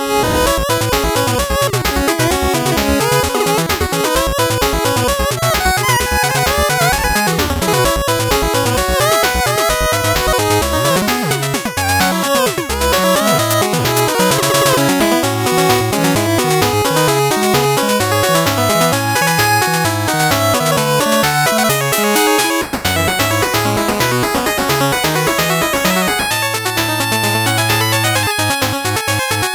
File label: 8bit bgm normal